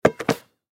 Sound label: Basketball Catch Arm; Blastwave